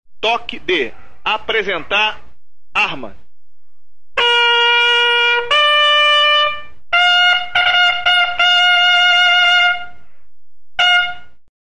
Apresentar Arma, toques de corneta; Apresentar Armas

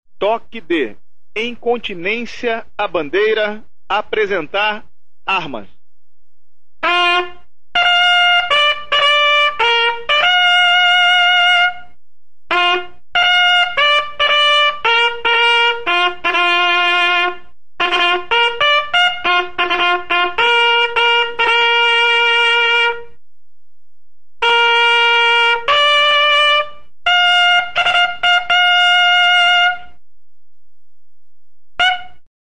Em continencia a bandeira, toques de corneta

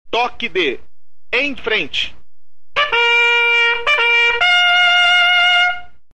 Em frente, toques de corneta

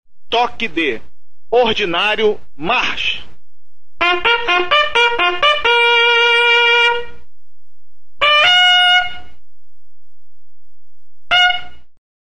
Ordinario Marche, toques de corneta

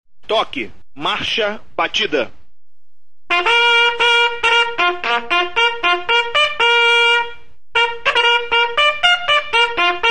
Marcha Batida, toques de corneta

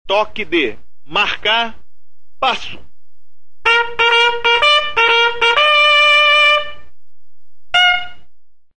Marcar passo, toques de corneta

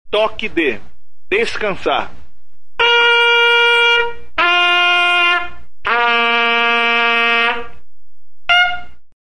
Descansar, Toques de Corneta

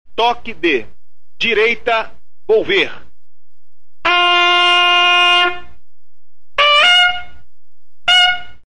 Direita Volver, toques de corneta

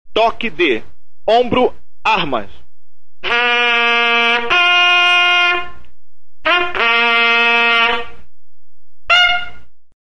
Ombro arma, toques de corneta; Ombro Armas

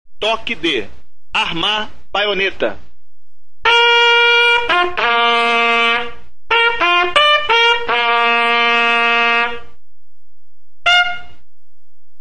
Arma Baioneta, toques de corneta; Armar Baioneta

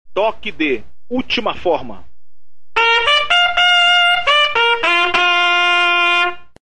Ultima Forma, toques de corneta

